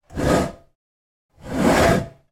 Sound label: household bathroom scales slide bathroom floor x2; zapsplat